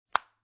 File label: Cell Phone Flip Close Razor, Technology, Cell Phone, Flip Close, Motorola Razor